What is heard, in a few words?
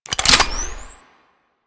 Rail Jack Rifle Reload; Warframe; Tenno